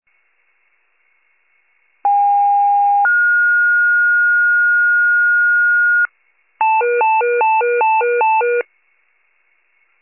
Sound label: FIRE pager alert